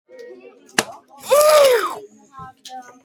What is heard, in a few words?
sussss slap